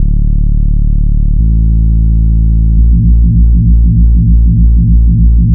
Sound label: 173 Bass, Drum n Bass